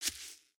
Foot Grass Walk, footsteps

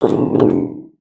Stun; Skullkin; creatures organic

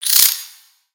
Spider Exert; creatures mechanical; Lego

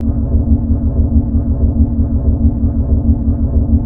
Launcher Loop

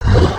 idle, creatures organic

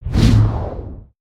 Full Swing; Summoner Valiant

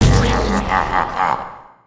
smashable; Blue Demon; destructibles

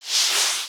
Text Outgoing; aronwk collection; ndaudio

